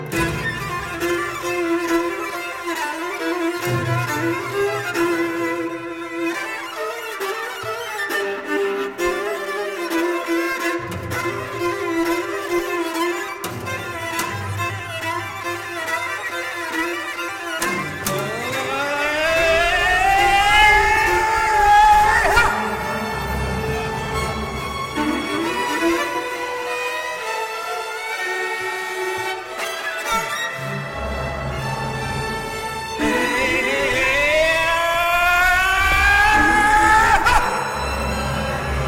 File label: Viola concerto; UCMR - UNIUNEA COMPOZITORILOR SI MUZICOLOGILOR DIN ROMANIA; Dan Dediu